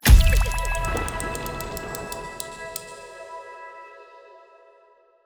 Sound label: UI Menu Levelup; A Year of Rain